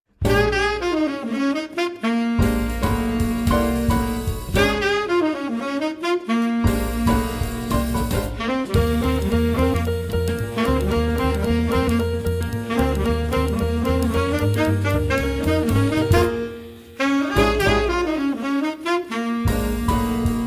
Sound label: Jazz Line Blues